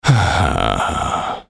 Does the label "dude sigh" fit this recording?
Yes